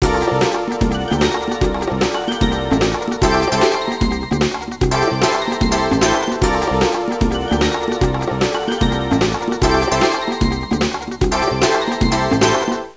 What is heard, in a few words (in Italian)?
suonerie musica jazz